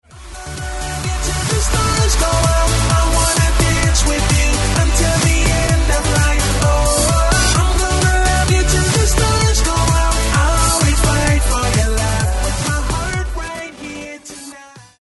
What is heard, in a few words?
funk; multitrax